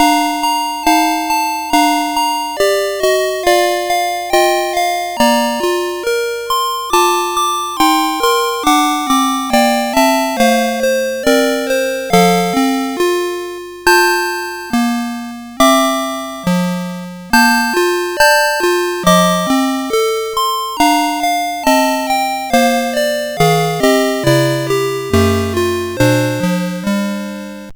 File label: Christmas Carillon, CSG8580R5